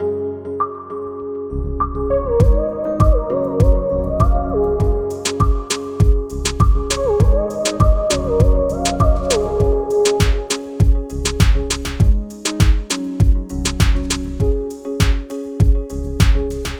Walkout Music; Mission To Zyxx

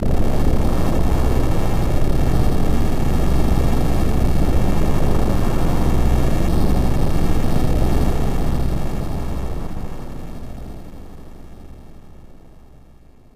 Earthquake; Chrono Trigger

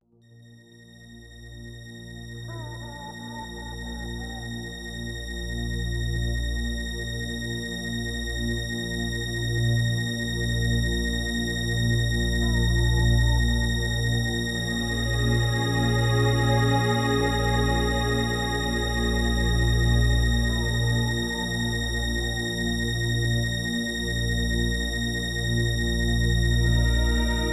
Confusing Melody; Chrono Trigger

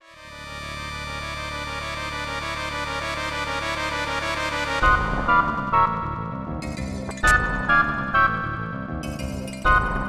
Unknown Fanfare; Chrono Trigger

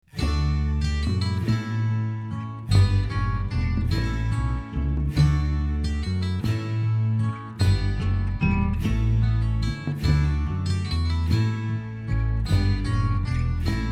Instrumental

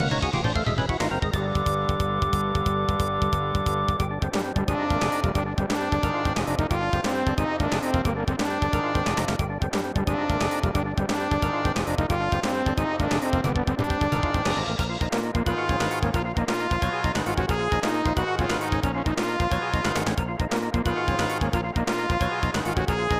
Boss Battle; Chrono Trigger

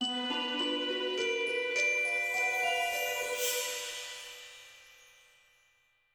Glock Transition; Season 1; Mission To Zyxx

